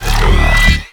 Sword Slam Charge; Vehicles; Abilities; Warframe; Nechro Mech

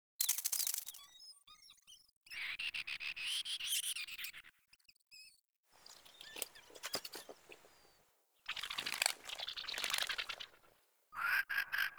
Infested Eris Exterior Critter Loop

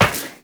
Run Metal Perforated, Footsteps; Warframe; Characters; Tenno

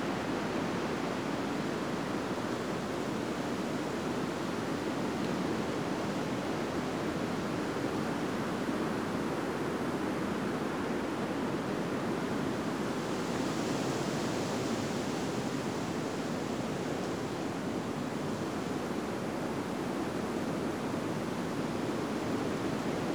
Forest Exterior Crevice Air Amb; Warframe; Ambience; Grineer Forest

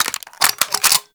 Sniper Reload; Warframe; Tenno

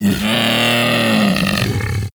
Vocal Death; Hunting Critters; Predasite

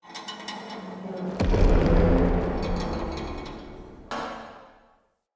Cave Mine Distant Boom

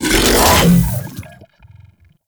Grendel Deluxe Devour Impact; Warframe

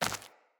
Grunt Step Default, footsteps

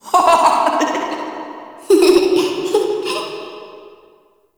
Recorded Children Misc Amb; Ambience; Dungeon Island; Duviri

